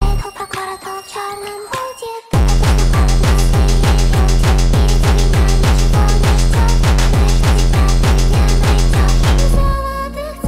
hardstyle remix

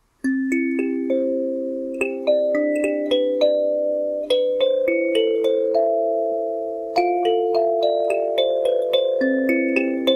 Kalimba Cover

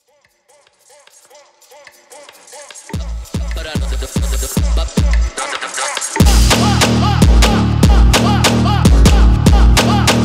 brazilian phonk for training

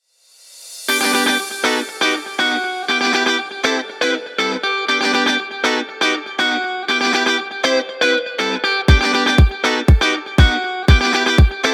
summer happy upbeat

